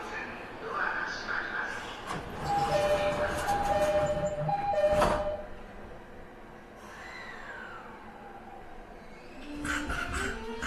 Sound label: joban mito E501